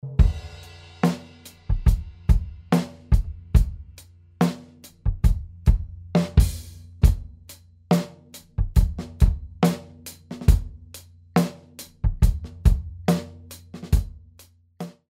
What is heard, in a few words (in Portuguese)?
bateria